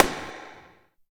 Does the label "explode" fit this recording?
No